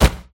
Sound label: citizen push; kidnap; scripted sequences